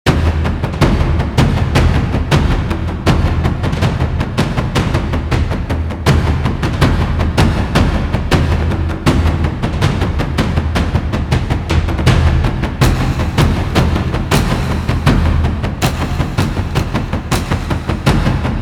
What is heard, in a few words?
taiko power